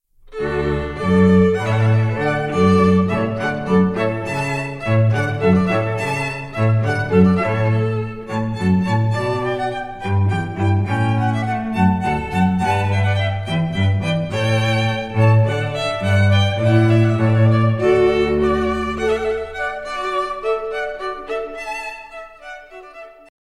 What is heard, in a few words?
Hornpipe; Handel